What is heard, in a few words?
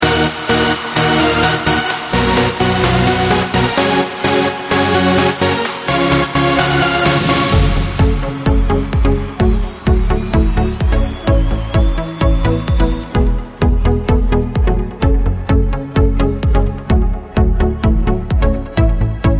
midi melodies